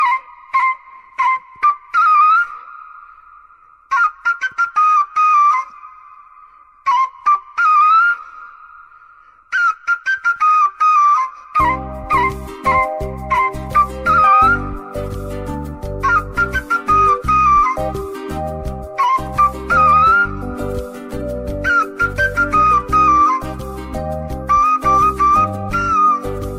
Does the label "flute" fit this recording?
Yes